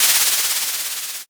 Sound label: curtain